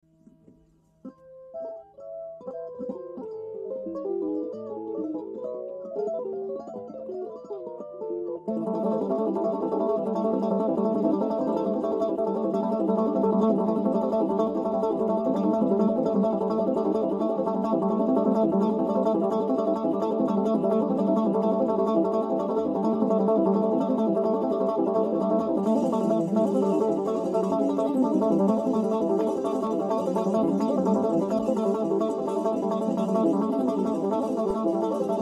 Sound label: background music; spacetracer